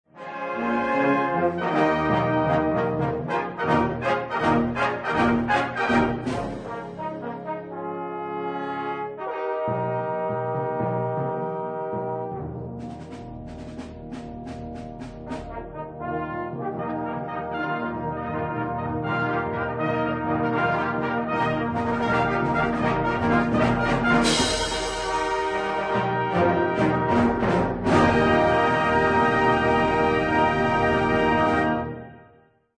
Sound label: Fanfare, BRASS BAND; Prairie Brass Band - Arlington Heights, Illinois